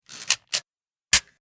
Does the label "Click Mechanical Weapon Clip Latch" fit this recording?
Yes